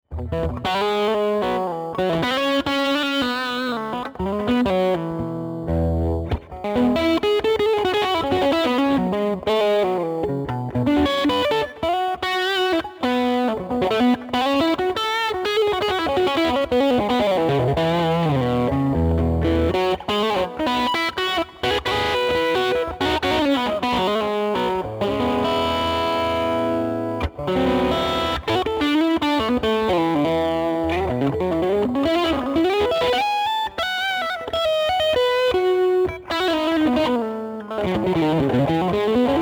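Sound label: humbucking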